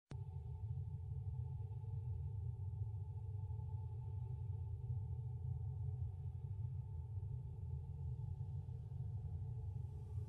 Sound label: Night Ambience; pc 2016; Popgoes